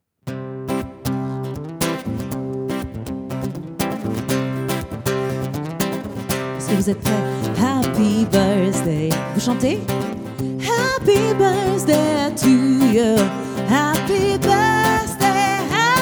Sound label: Happy Birsday Duo